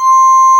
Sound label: ANA PIPE C4